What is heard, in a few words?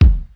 Bass Drum, Yamaha DD-35